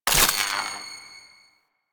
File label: Void Tear Luminous Pickup; Warframe